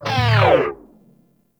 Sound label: Proj Small Fire; Warframe; Lizzie